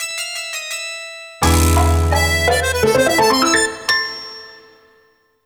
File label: Jingle Failure; Tottoko Hamtaro Nazo Nazo Q Kumo no Ue no Hatena Jou; NDS